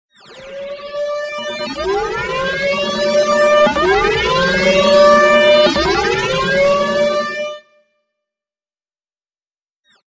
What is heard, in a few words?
Sci-Fi Alarm